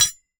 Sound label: Hole Saw Rattle, Hole Saw Bits